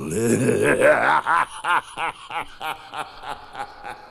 laugh demon

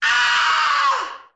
scream female; ooh ahh

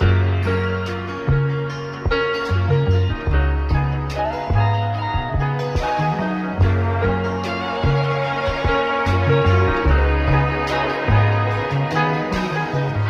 guitar and piano and strings, Phonky Loops

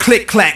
click clack, Voice; ooh ahh

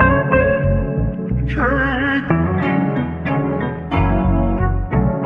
guitar, Phonky; loops

